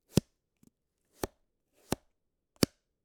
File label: cardboard raw